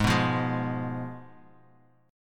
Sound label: G sharp Minor 6th G sharp down; G#m6 strummed; JGuitar